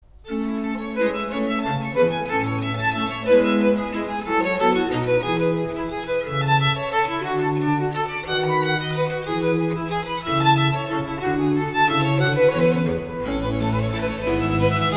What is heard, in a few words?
violin; Arcangelo Corelli; Stefano Montanari; Bizantina